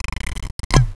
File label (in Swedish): Whisk ork; kork dras ur flaskan; Whisky